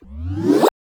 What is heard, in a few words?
boing; Spectrasonic Distorted Reality 2; BEMUSED